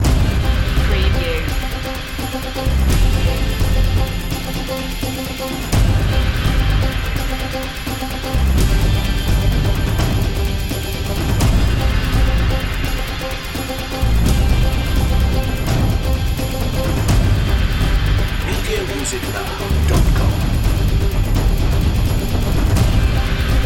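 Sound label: song of glory rhythm group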